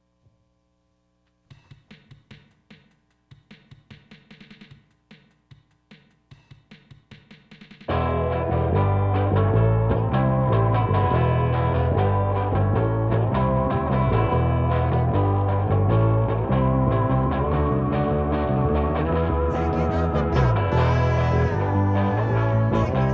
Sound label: taking over my mind w bass keys; mackie